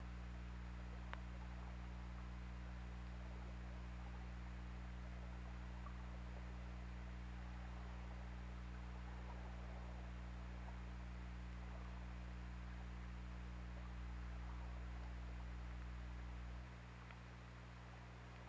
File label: Orca; OrcaLab